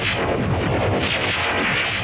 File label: WAND, proton pack